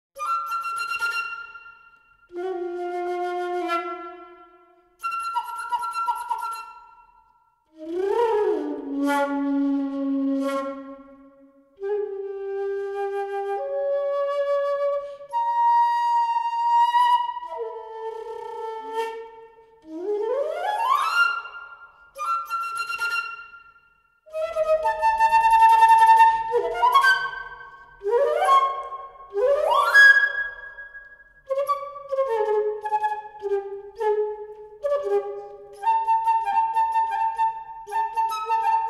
flute solo

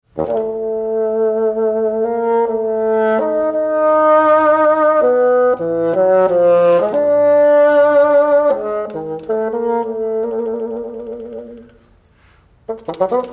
Five Little Pieces For Bassoon

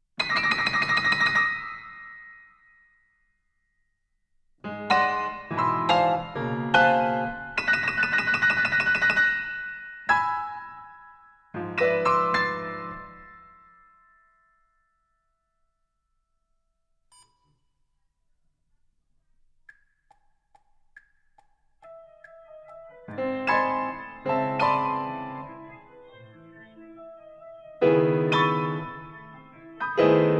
piano

